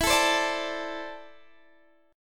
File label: E 7th Suspended 4th Sharp 5th, Guitar, DADGAD